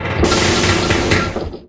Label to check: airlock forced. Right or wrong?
Right